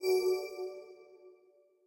wild swoosh; berry burst

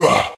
zombie

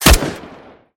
groza, guns; resurviv

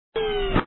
gate close

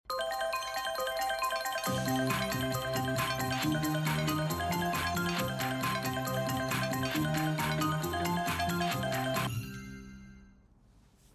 music win

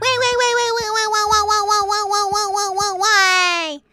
weiweiweiwei wawawawawawei; korone; vtuber

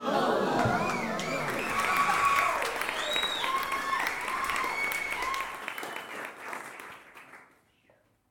crowd Reaction brothel big Reveal; spellbound

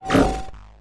plasma shield

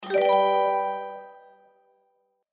won, klondike solitaire

